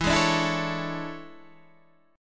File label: E 13th, Guitar, Standard; strummed; JGuitar